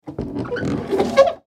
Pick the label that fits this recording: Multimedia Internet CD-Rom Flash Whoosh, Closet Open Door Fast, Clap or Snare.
Closet Open Door Fast